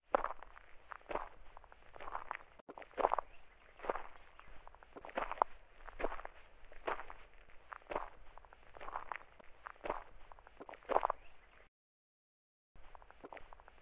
Gravel footsteps guard loop

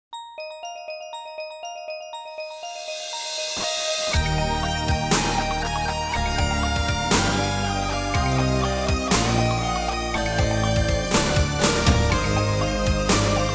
Ending - Heartwarming; Aero Fighters 2; Sonic Wings 2; arcade

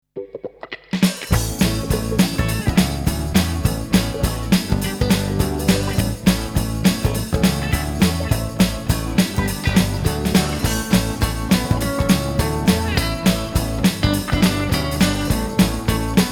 Busy pop; Canary; Mika Theme

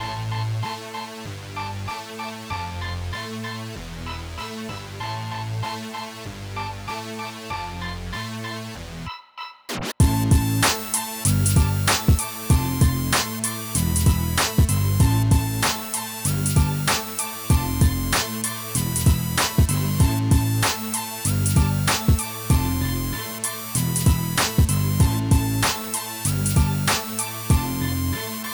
Radio Hiphop; Gangstar West Coast Hustle; Android

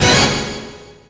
Jingle ~ Mission Start; mario super sluggers; wii

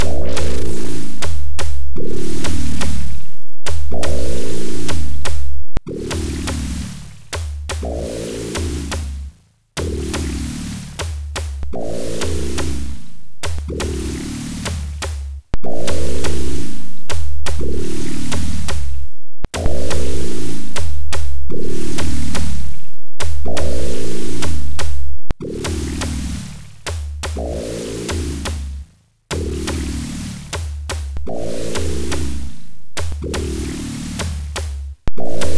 Loop (Heart); rpg tsukuru dante98 ii pc-98 1996

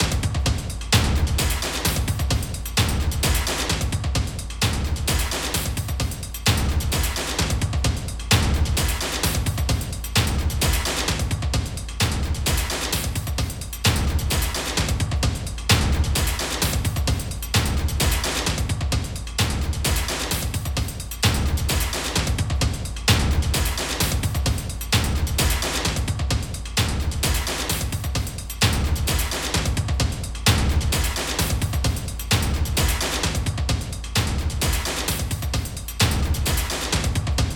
BGM ingame swamp; bail or jail; obakeidoro; windows

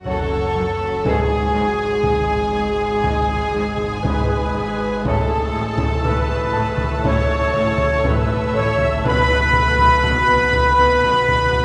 Defeat; Wenlock; Barbie The Magic of Pegasus; Windows

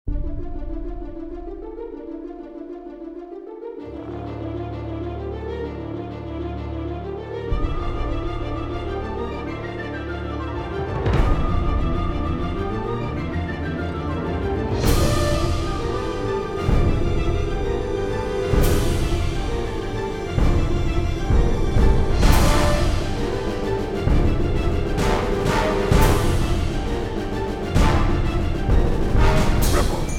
High Suspense Orch; Pitfall: The Lost Expedition

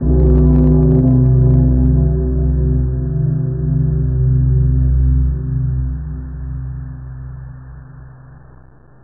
Retry Jingle; Bob's Onslaught

